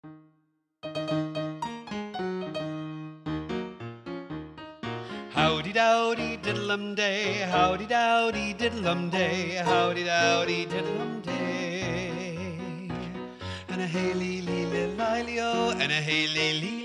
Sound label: Howdidow - Baritone